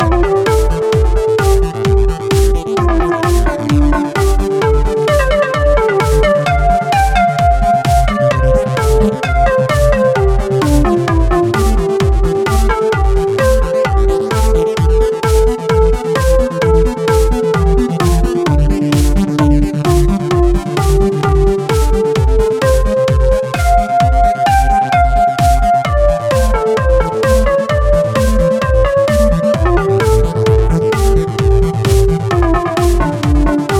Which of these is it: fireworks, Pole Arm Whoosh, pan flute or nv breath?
pan flute